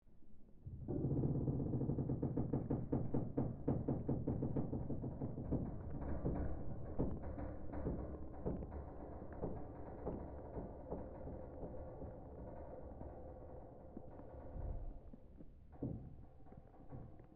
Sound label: Created Ambience Ship Hull Creaks Shifting Ice Loop, Created environment inside a ships hull; Created environment inside a ships hull (Spaceship or Seaship); Artificial Sound Effects